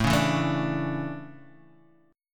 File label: A Major Add 11th A, chord; Aadd11; strummed; JGuitar